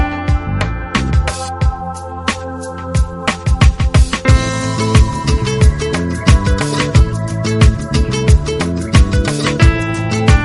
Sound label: PIANO FUNK; ORIGINAL MIX